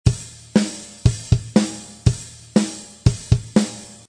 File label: Dirty Rock, Heavy Modern Funk & Rock; Loopology